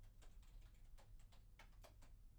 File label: keyboard; IEEE AASP